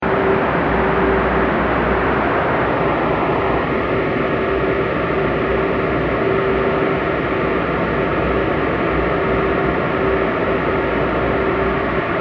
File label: Music Extracted From Pure Unarranged Noise